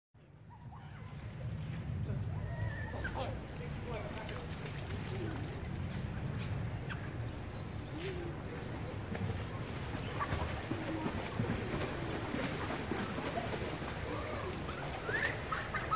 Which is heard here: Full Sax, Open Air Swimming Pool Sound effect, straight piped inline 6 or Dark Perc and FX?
Open Air Swimming Pool Sound effect